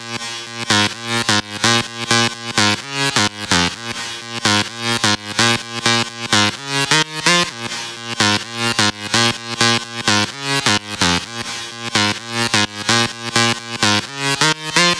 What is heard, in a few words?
128BPM Lead Loops Sidechain - A#; MELBOURNE DROP VOL. 4